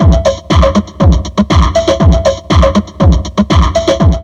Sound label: Drum Loops Crazy Processed